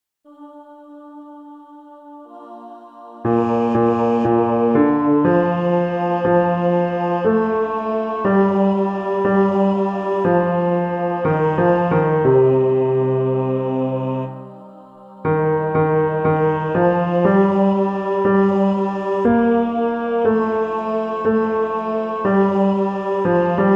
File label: Las Posadas bass